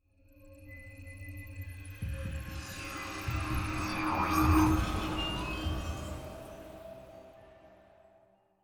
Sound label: STING; Laundrette; Timeshift